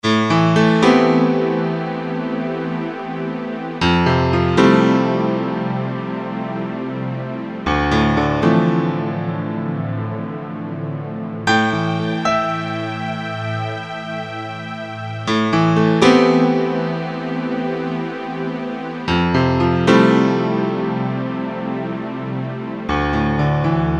Acoustic Grand + Strings; IK Multimedia; SampleTank Free

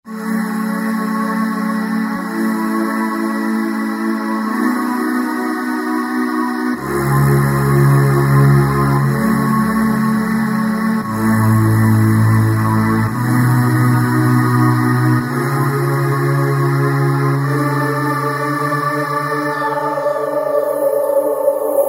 Vocalizing, Roland V-Synth; Factory Patches

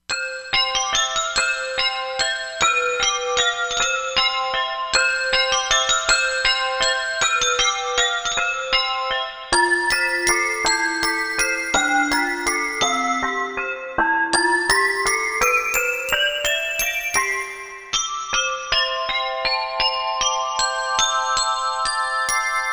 Bellz, Korg MSC-07S Synth

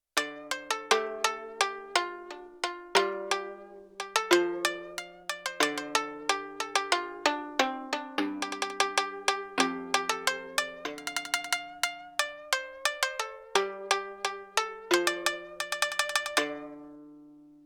Long String, Shamisen, Yamaha TX16W

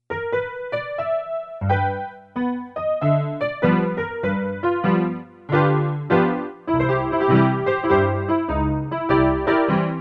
Honky-Tonk Piano, Casio CSM-1; Tones

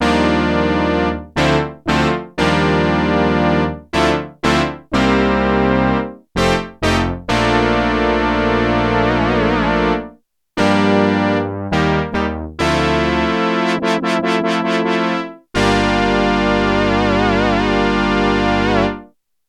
Korg DW-8000 BRASS II, Factory Patches

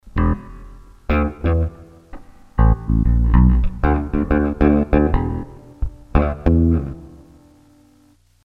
Slaping Bass; Sony HR-GP5

